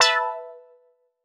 Quart Stab B; PowerFX - Nu Jazz House

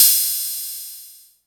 RID KPR, Korg EMX-1, Drum Machines

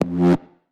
bass tweak process F; Polyend; ARC Noise

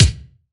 BD, BASS DRUMS; EdgeSounds

